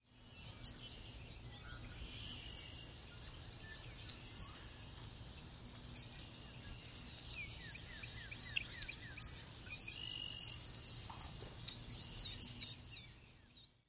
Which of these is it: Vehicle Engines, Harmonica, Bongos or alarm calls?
alarm calls